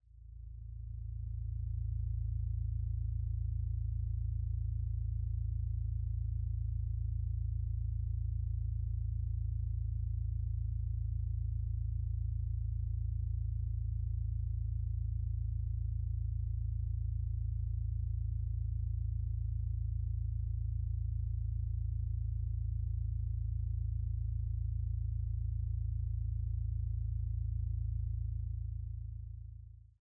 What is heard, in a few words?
bg music